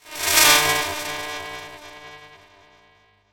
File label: Title Transition Distortion; Adobe; Production Element